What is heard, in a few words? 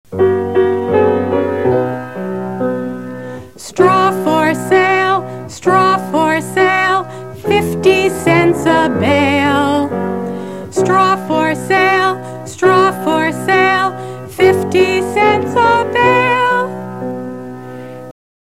Straw Peddlers Song - Vocal